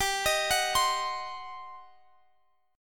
G Major 7th Suspended 4th Sharp 5th, G, down, Guitar, Standard; GM7sus4#5 arpeggiated; capo=2